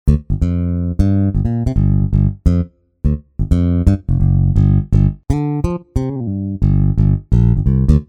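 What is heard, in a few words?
Stein Bass; TURBO VL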